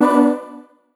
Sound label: UI TYPE